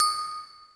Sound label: AGOGO BEL; Crypton; DrumBase5000